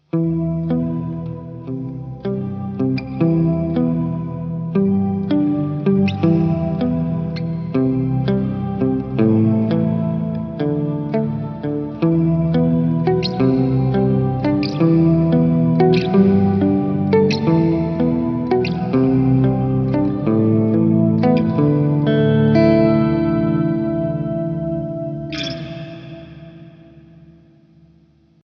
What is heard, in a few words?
hx 5th